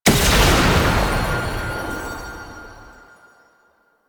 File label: Gunbrella Alt Shot, Weapons; Warframe; Tenno; Koumei